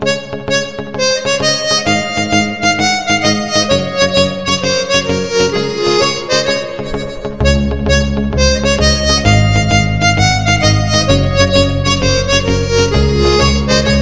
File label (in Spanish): tono de iPhone; Feluda